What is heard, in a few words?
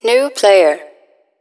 newplayer; Mumble